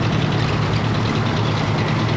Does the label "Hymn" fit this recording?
No